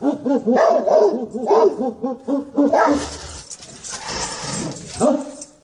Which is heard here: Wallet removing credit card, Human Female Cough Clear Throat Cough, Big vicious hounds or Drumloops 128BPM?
Big vicious hounds